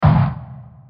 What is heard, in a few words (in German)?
schuss